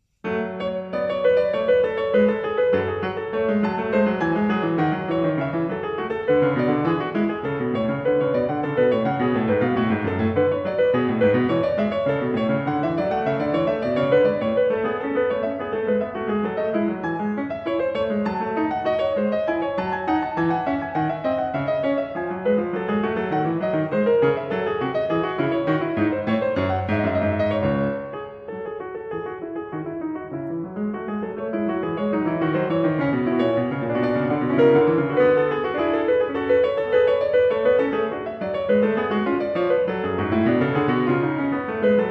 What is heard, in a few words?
prelude no 2 in c minor; bwv871